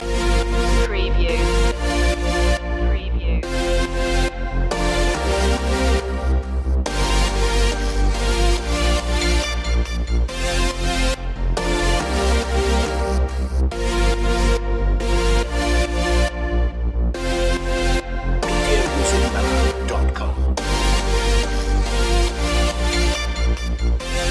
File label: oriental loop